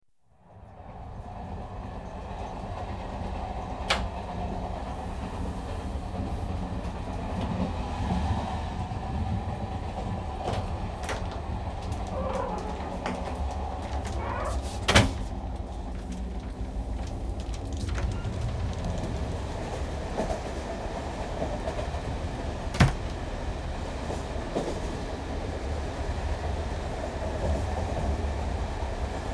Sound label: Diesel Trains Move from toilet; The BBC Sound Effects Library